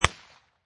Pistol Liberty 22 Target Pistol Single Shot Exterior At 45'; Gun / Pistol: Liberty 22 Target Pistol, Single shot, Exterior, at 45 feet.